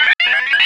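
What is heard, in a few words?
sphere blips large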